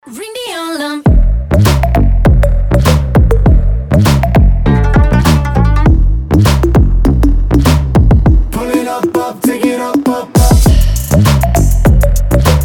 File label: remix